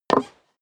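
step high heels wood creak single stair up, footsteps; schritte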